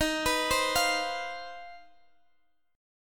C Minor Major 11th D sharp

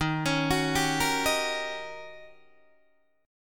D sharp 7th Sharp 9th, Guitar, Open D

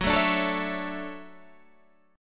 D-Major 9th F sharp

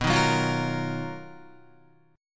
B-Major Sharp 11th B down Guitar; BM#11; strummed; JGuitar

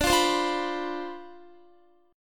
D Minor 13th D, Guitar; Dm13 strummed; JGuitar

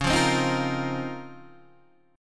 C sharp Major 13th C sharp, Guitar Open D; down; chord shape

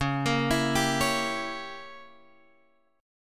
Db Diminished 7th Db down Guitar Open F; arpeggiated; JGuitar

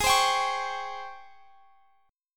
Ab Major 11th Ab Guitar Open F; AbM11 strummed; x,x,20,19,22,20

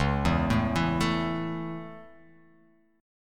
C sharp Minor Sharp 5th, C#m#5 chord; C#m#5 arpeggiated; JGuitar

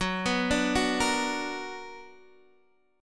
B Minor Major 7th

